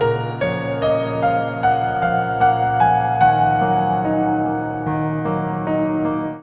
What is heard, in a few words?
piano angel, ambience; dod1